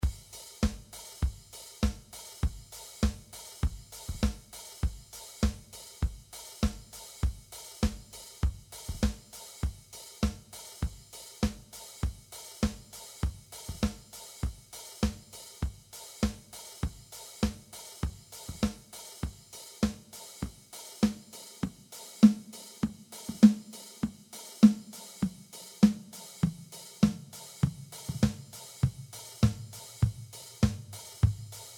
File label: museq LowPass